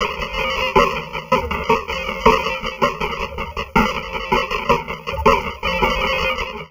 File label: Drum Loops Crazy Processed